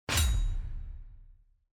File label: UI Battle Button